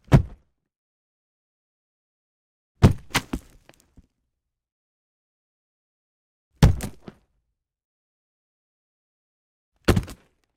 Body Fall (Dirt) 4X, Martial Arts and Human Impacts; Hollywood Edge